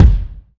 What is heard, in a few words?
subtle reverb kick single shot f key; kick drum samples